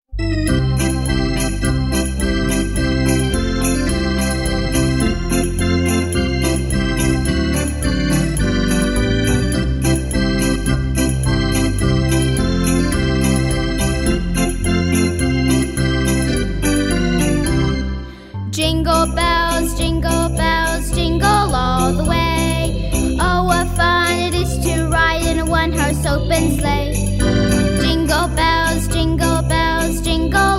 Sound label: jingle bells